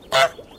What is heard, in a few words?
honk